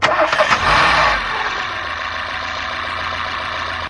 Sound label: Electro FX; Ueb HE Electro FX